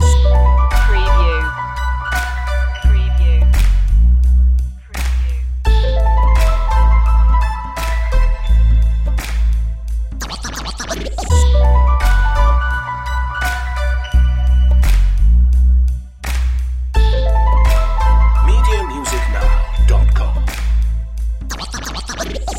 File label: urban streets loop